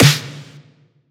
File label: snare mover; Drum One Shots; SD MIDNIGHT Sample Pack; Slate